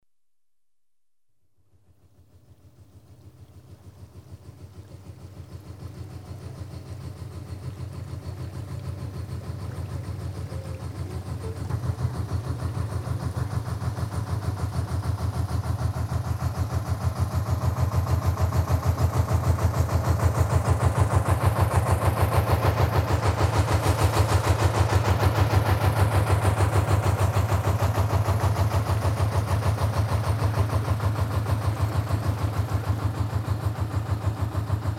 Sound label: pass right to left, Ships & Boats; The BBC Sound Effects Library